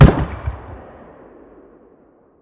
gl fire dist; gmod; arccw uc commons attachments

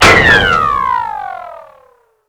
armor rico; gmod; lvs framework

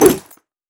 wrench swing; melee weapons; tfa starwars melee weapons pack

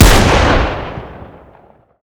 fire; gmod; arccw uc commons attachments; ub12ga

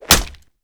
punch gun wall

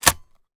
breaker close; gmod; arccw uc commons attachments

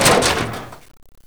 impact soft, physics; gmod; lvs framework

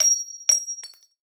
casing 40mm; gmod; arccw uc commons attachments

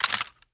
weapon remove; weaponweights content

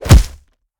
punch player; gmod; arccw uc commons attachments

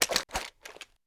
draw, armas

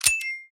mech, rocket; gmod; arccw uc commons attachments

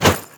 bat heavy, metalbat; melee weapons; tfa starwars melee weapons pack

